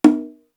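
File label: dynamic B, drum kit; East West; Global Sounds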